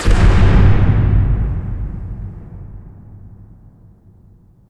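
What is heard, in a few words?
explosion distant medium; Source Engine; Portal 2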